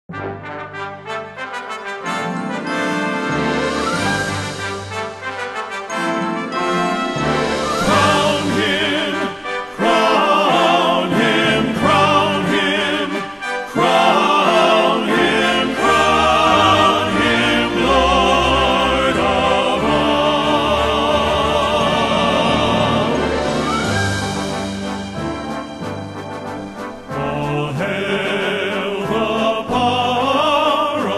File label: men's chorus